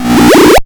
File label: Hard PCM Chip; chiptune